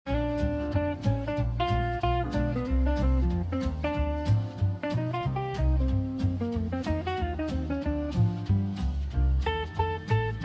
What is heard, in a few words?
music newyear